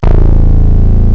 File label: SYN BASS; M8; CMI Factory